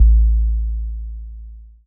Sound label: Sub